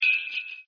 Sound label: present sleigh bells